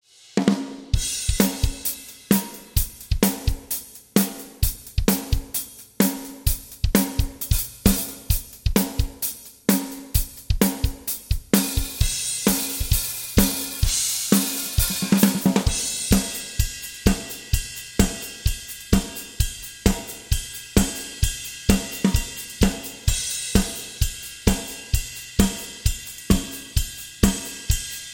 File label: Drums Sony DRE 2000 Reverb A; Audio Ease Altiverb XL plug-in is a convolution reverb